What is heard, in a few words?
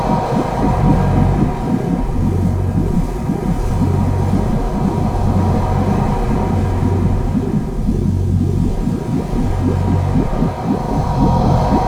Projection Execution Aura Loop; Warframe; Focus; Naramon